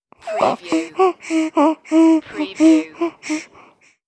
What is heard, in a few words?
Baby Breathing